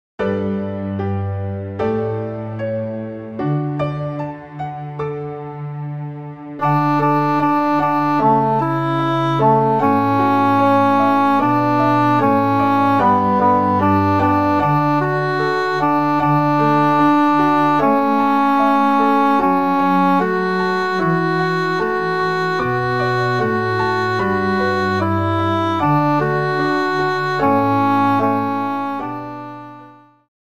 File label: clouds veil alto sample, backing tracks